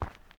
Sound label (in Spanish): Paso zapatill cemento